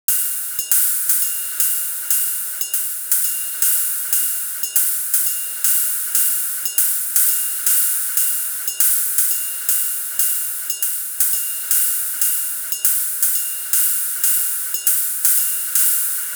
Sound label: hihats